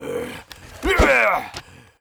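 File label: war attack, Mob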